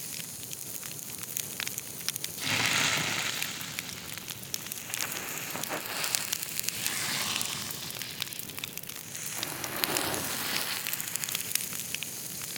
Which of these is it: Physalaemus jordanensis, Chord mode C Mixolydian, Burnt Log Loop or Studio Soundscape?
Burnt Log Loop